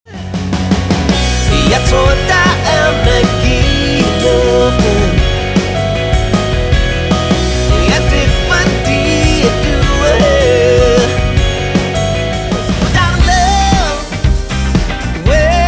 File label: Medley